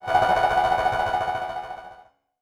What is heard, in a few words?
Multimedia Internet CD-Rom Flash Click Multiple